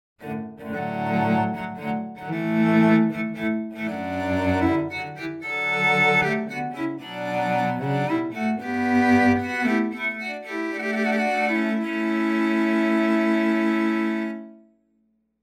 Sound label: Cellos